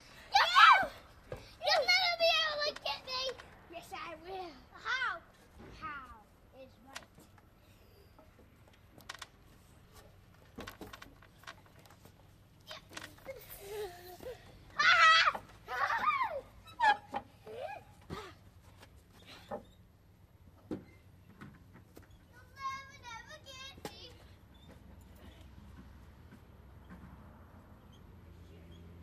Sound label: Boys Play Youll Never, kids play